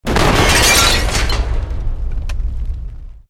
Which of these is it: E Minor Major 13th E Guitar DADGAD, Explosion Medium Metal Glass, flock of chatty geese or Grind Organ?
Explosion Medium Metal Glass